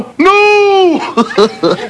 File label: nooo hehehe